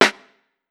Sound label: Snare Room, drums